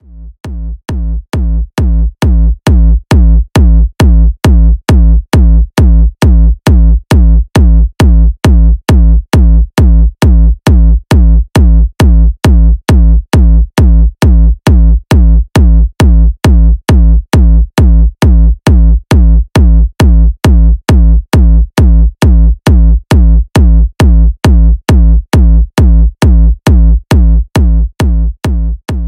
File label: signature kicks; zumstein